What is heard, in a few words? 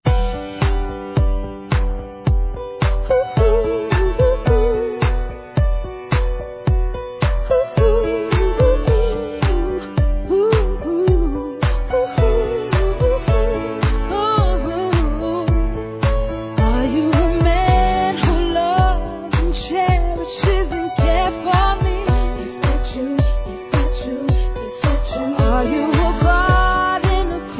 rnb